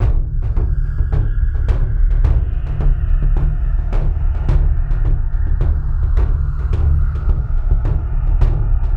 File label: Mansion Heartbeat; Buried; PS3; Xbox 360